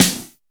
piercing snare drum f sharp key

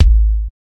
reverb kick drum single hit A key

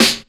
loud steel snare drum a sharp key

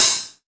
high hat sound a sharp key

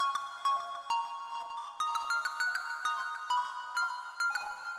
grain deep texture melody 100 bpm; synth; instruments; loops

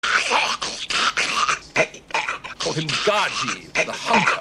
LONG GROWLING

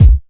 original bass drum single shot a sharp key

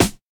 short snare one shot f key; snare drum samples

